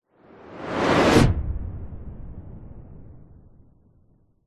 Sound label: cinematic whoosh ultra sonic close big long tail; sound design